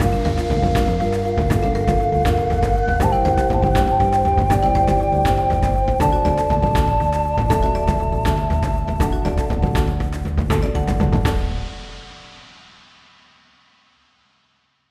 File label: Boss Win; Zuma's Revenge